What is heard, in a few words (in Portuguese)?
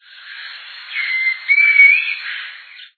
bico chato grande